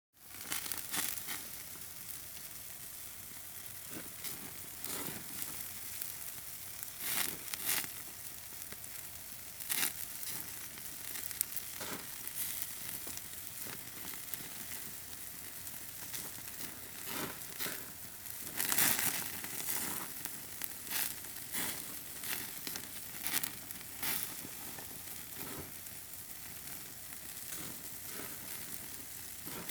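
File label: sizzling shashlik barbecue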